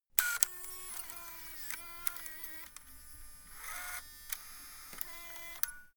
servo move click

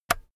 keyboard external key release

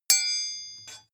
sword hit ring soft

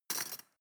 cutlery teaspoon drawer place

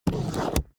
plastic slide small

